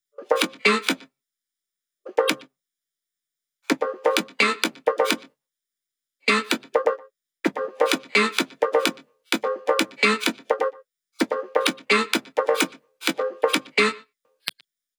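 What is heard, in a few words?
Drum Loop 128BPM hi-hat Perc; VEC5 Drumloops; VEC5 Multi Loops 128BPM; Loop Elements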